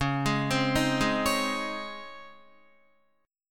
Db Major 7th Suspended 2nd Db Guitar; DbM7sus2 arpeggiated; JGuitar

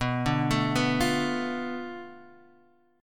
B Minor 7th Flat 5th B Guitar Open D

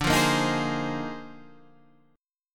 A sharp 11th

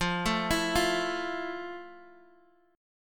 F Major 7th F, Guitar, Drop D

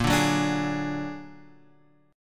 A sharp Augmented 7th A sharp; A#+7 Chord {6 5 4 7 X 4} strummed

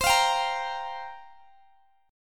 C 7th Suspended 4th, Guitar, Open G

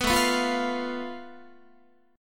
A Suspended 2nd Sharp 5th, Guitar; Asus2#5; strummed; 14,17,14,15,15,16; 4A,4E,5A,5D,5G#,6C#; JGuitar

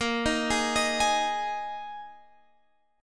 Bb 7th Suspended 4th Bb

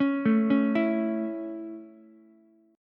Db Minor Sharp 5th Db Ukulele; arpeggiated; Dbm#5; JGuitar

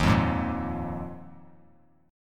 Eb Suspended 2nd Suspended 4th, Bass Standard; Ebsus2sus4 strummed; JGuitar

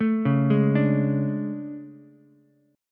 A Major 11th, down Ukulele C6; AM11; arpeggiated; JGuitar

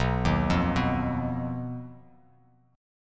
B Major Flat 5th B Bass Standard; BMb5; arpeggiated; 7,6,3,4

